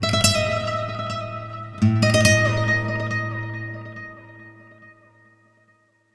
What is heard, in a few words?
Oneshot Acoustic Guitar; zombie army 4 dead war; ps4 stadia switch windows xbox one